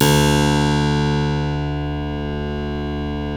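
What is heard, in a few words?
D1 clavinet right, Keyboards Acoustic; USB Soundscan vol.17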